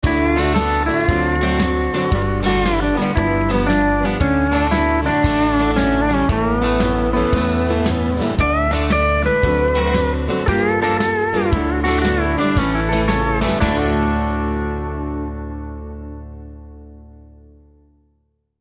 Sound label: Shuffle